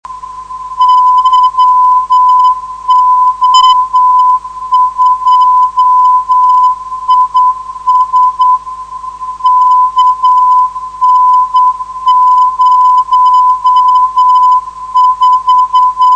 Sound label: narow